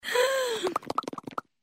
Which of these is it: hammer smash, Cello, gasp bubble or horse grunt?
gasp bubble